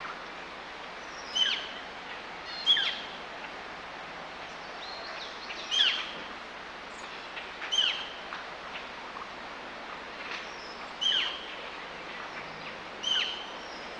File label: bird